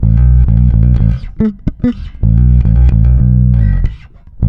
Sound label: NASTY B, bass; Spectrasonics; Bass Legends